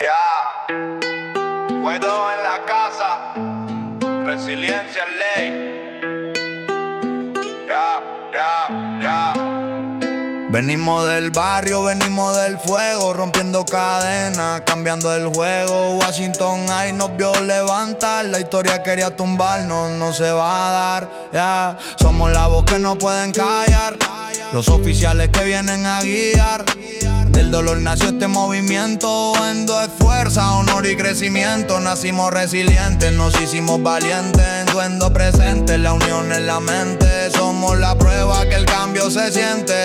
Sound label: Version rap